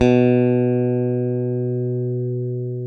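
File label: CLN STRAT, Synclavier, GUITARS; Sampler Library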